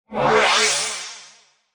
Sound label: upgrade; hella-farm